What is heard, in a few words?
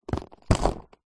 skateboard mini board plastic set down; sport